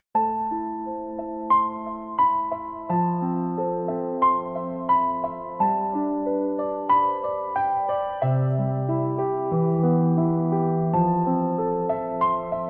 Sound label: forest relaxation